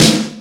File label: Mega Drums, E-MU Pro-Cussion; drum machine